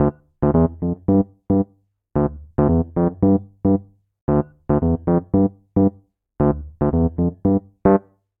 Ostinato Bass Ascending D Minor 113 bpm, Electric Keyboards; MIDI Clips; Ableton; Factory Packs